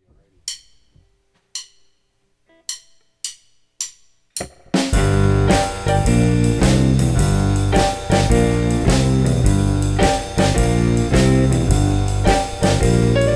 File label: Shuffle in G minor, blues